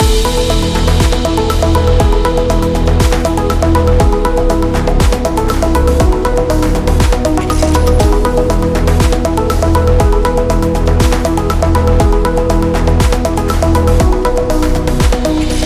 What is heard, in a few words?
Technology Loop